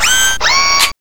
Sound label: laptopgun drop, weapons